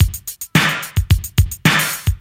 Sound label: 73 bpm 80s breakbeat sample f sharp key, Free drum groove - kick tuned to the F# note